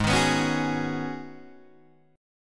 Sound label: G sharp Minor 11th G sharp Guitar DADGAD, chord